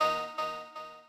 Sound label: Stabz, FX